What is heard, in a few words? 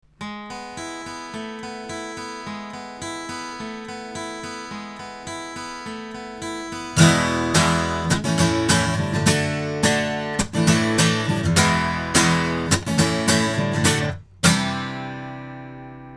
guitar; Lauten Oceanus; cardioid; microphones